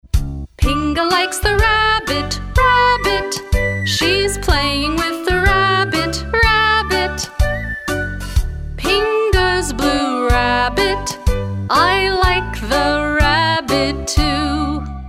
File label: singing